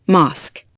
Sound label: mosque